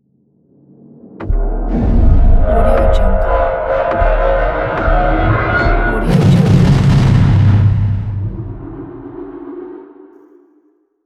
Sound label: alien technology